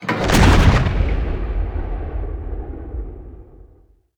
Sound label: gdi mastodon fire